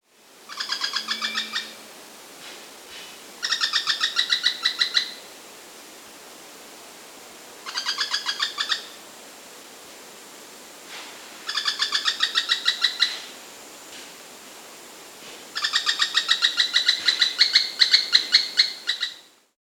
Buff-Throated Tody-Tyrant (Hemitriccus Rufigularis), Andean Birds; S. America